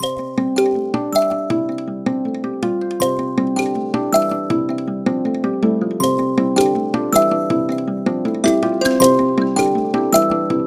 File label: Awakening, Natural Elements, ringtones; Google; Google Sounds